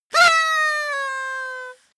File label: paper hoot sad